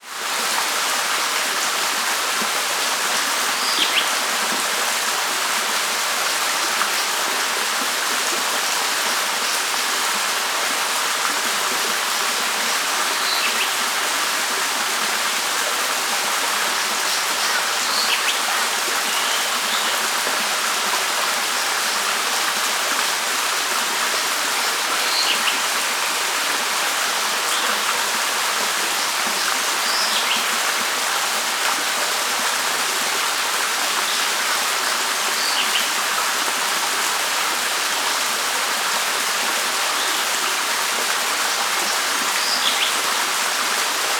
nature waterfall creek forest birds

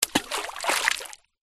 water splash stone lake

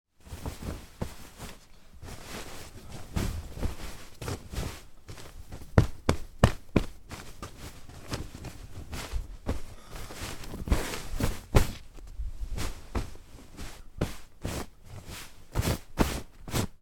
bed duvet pillow tidy making; household; sound effects; sound effects one

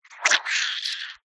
human baby poop wet squelch

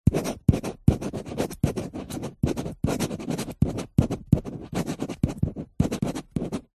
office pen biro writing on glossy paper

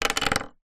office pencil small roll on wood surface

